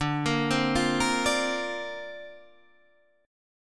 D Minor 7th Sharp 5th, D, down, Guitar Half Step Down